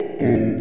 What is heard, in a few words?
ohooo